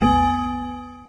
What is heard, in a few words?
mystery default sound; windows 9x; sound schemes